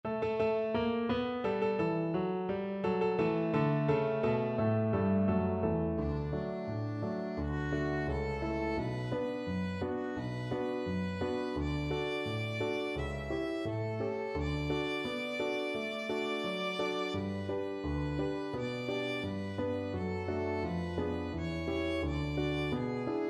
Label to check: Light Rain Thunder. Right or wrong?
Wrong